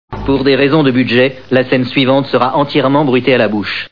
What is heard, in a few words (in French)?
bruitee bouche